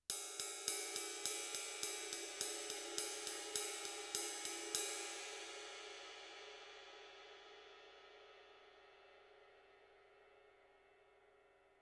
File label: 21 RIDE ROCK, cymbals